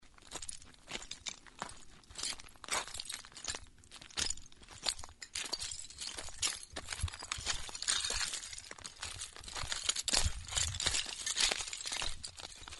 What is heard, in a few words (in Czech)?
Zvuk haldy